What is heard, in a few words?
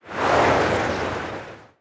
free spins enter; Corrida Romance; wazdan